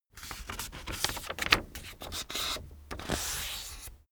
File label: sheet fold